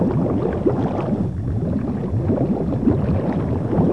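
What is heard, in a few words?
underwater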